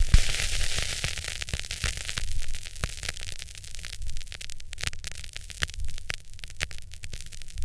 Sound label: VINYL L, Drum & Bass 160 BPM; Ueberschall; Drum & Bass Flash Trackz